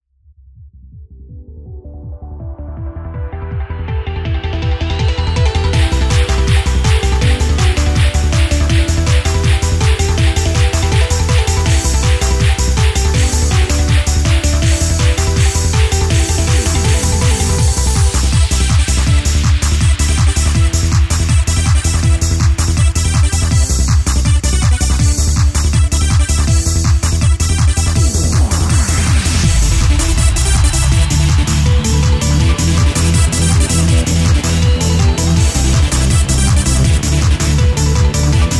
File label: Dance Disco Train